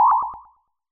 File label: Cry